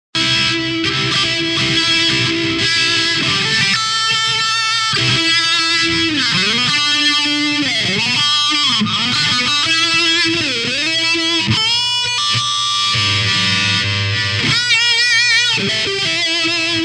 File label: Distorted Guitar Solo 87 Cm, Rawking distorted electric guitar solo LOOP; 87 bpm in Cm Loop; Musical